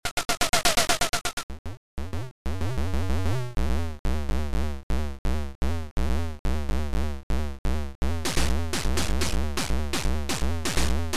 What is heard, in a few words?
game music; Bubomatik